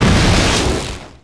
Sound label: explo droid, impacts explos